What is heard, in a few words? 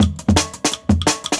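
Jungle Breaks, 170 bpm; 90 s Sample CDs; Original Jungle Breaks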